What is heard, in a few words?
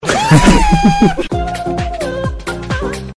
waahooo blululu hahahaaa